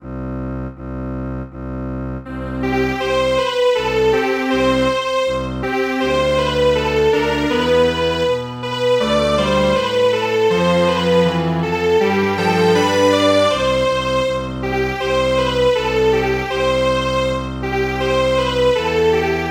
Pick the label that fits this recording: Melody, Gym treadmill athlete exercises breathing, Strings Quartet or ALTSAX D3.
Strings Quartet